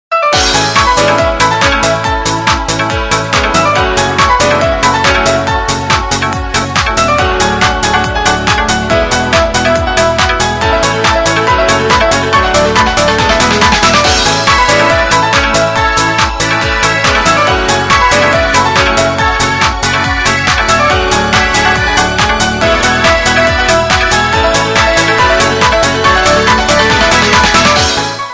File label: Nokia Remix